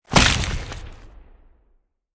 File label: Skull Explosion